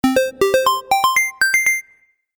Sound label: Game App Little Victory, Video Game Sound Effects